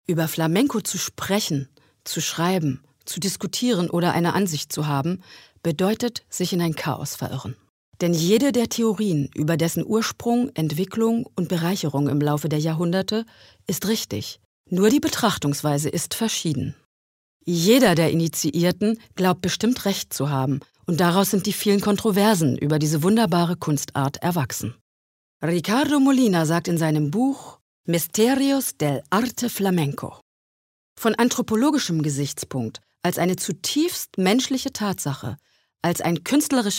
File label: Flamenco